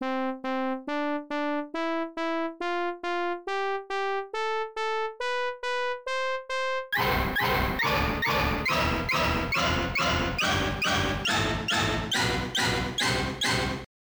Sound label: Speaker Test; Quiz Gakumon no Susume; arcade gamerip 1993